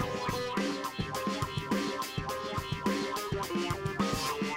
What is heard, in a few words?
sitar